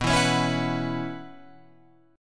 B Suspended 2nd Suspended 4th B Guitar Open F, chord; strummed; 11,11,13,11,14,13